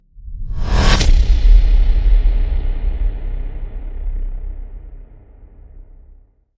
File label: Hit Shred Straight Shot